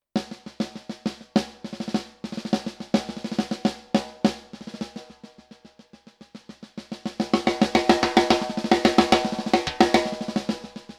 Gretsch Black Alu Anniversery played

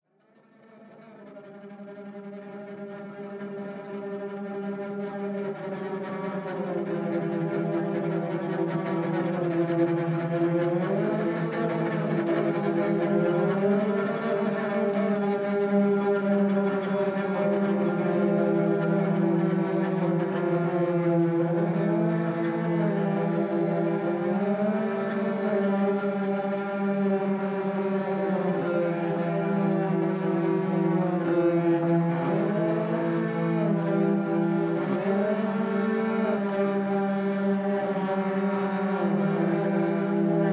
Cello LEMON St

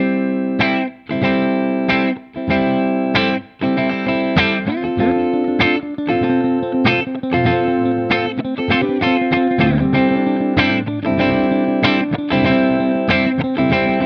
Pro Mod Clean Both; Charvel Joe Duplantier

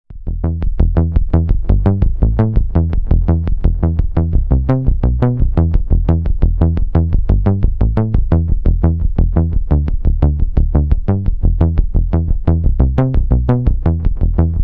cool bass

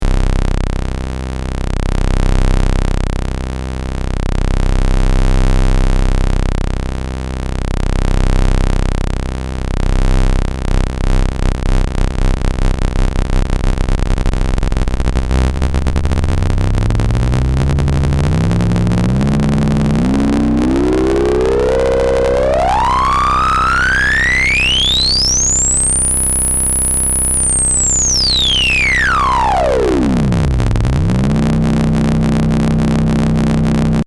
Blade CV In Modulation

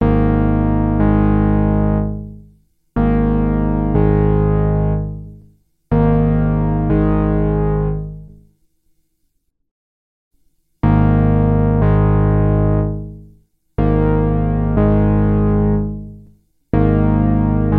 boss dc2w sdd320 comparison

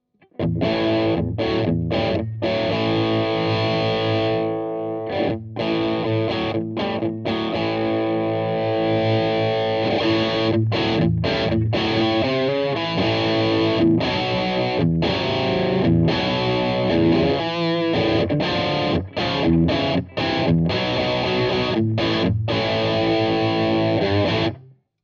Tubescream Amp LP

